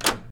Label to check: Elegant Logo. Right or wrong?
Wrong